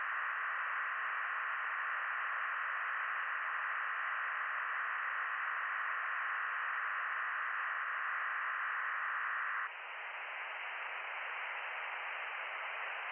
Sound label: from radio, 7177KHz